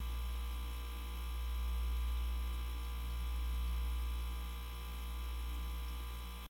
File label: reel rotating, slots; garrysmod; blues-slots